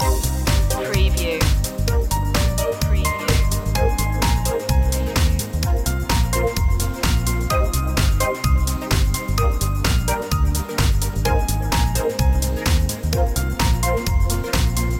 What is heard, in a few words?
up to this beat loop